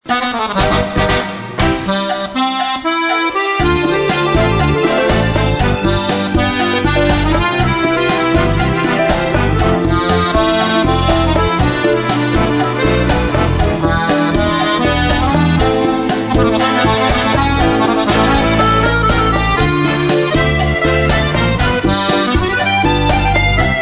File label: latino chacha